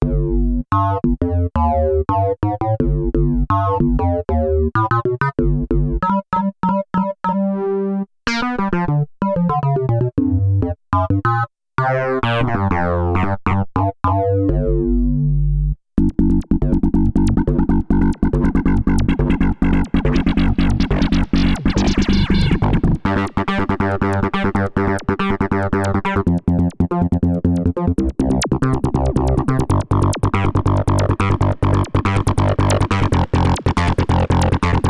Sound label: Licker Bass